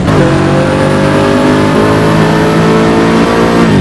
third, vehicles

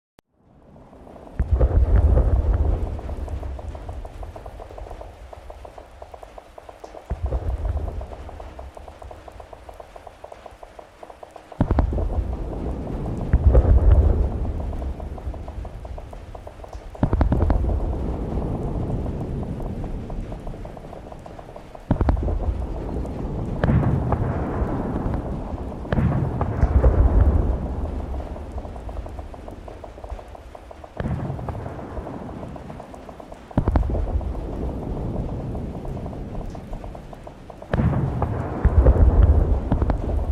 Distant Gunfire & Artillery; tccc; Training Aids; FINAL EX SOUND FX

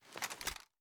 inspect; gmod

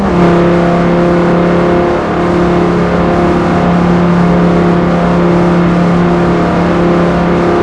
fourth cruise, vehicles; 850r

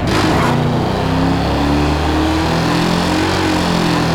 second, vehicles; crownvic

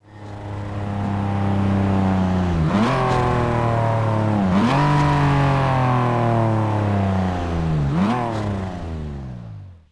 throttle off, vehicles; gmod; tdmcars; pon fiero gt

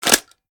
raise drum drumin, reloads; gmod

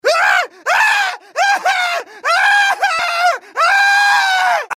scream, male; gmod; npc

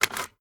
ar reload fast mag out, weapons; gmod; viper

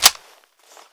mag in foley, mp5; weapons; gmod; kryceks swep; navaro mp5

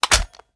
reload fast mag in, reloads; gmod

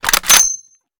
sn rechamber; gmod; iw8; alpha50

